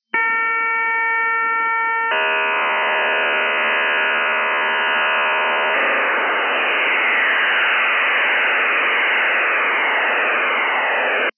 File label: ISR NY HYBRID